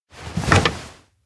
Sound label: school sign install; anemoi